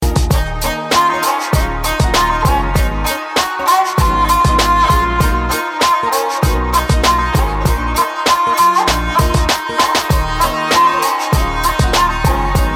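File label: Violin Melody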